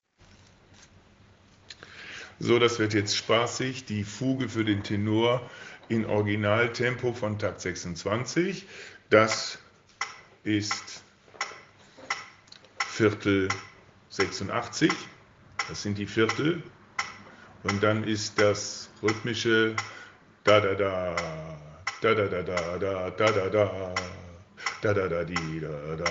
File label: Tenor